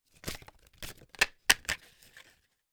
Deck of cards handled, shuffled and tapped onto table; Timothy McHugh; Playing Cards & Betting Chips